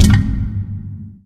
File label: lightbridge, footsteps